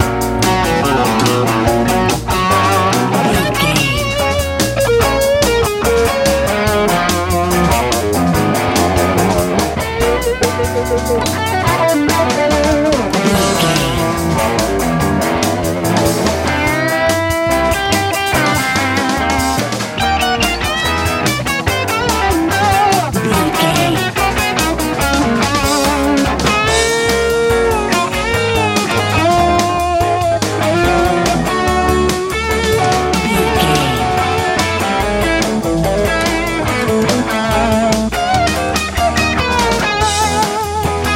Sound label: easy rock